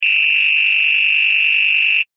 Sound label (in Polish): ton